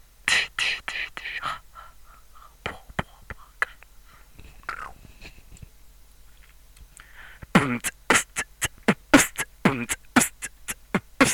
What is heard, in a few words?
Beatbox